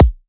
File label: kick; Rialasten 2022 Xmas Sample Pack; 2022 Xmas Packs